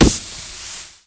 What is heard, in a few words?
bag flour; Explosions